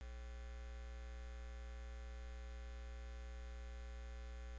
pad

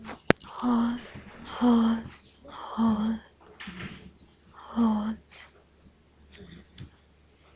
hose

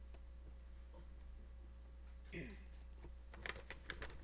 hiss

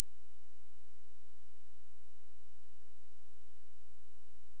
cloth

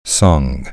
sung

